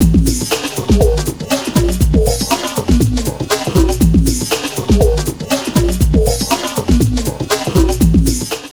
Drum Loops Crazy Processed, 120; USB Soundscan vol.07; AKAI

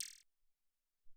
Blip

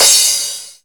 CRS, Korg Electribe EMX-1

